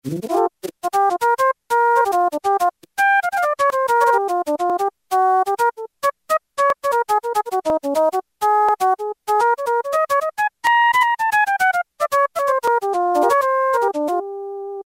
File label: Jazz Flute, Yamaha TX81Z